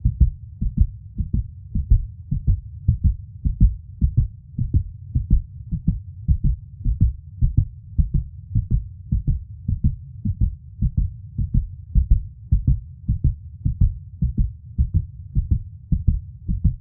Heart Beat Strong Pounding Blood Flows In The Veins